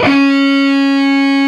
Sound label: LONG SOLO C#, HEAVY METAL; Greytsounds